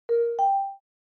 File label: Notification alert